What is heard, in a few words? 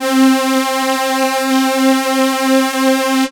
Synths Note C; House; Best Service; House Definition VOL-1